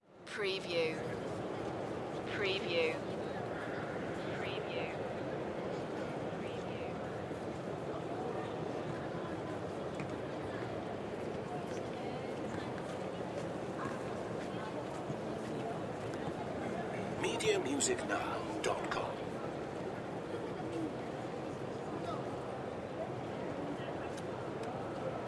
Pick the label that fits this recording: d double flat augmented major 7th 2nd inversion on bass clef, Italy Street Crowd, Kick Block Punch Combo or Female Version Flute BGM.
Italy Street Crowd